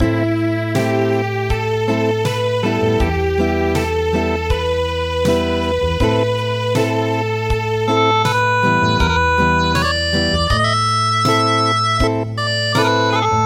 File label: Acoustic; Choshi Tairyou Bushi